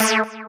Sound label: Synth Cuts; Vengeance Samples; VES1 Instruments